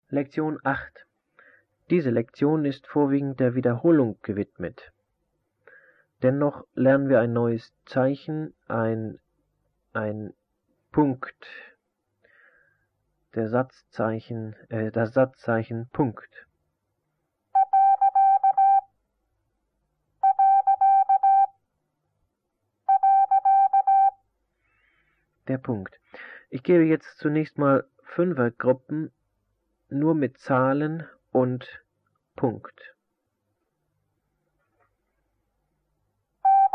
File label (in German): Morsen; Onlinelehrgang